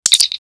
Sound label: call, Combadge sound; Call.wav Combadge sound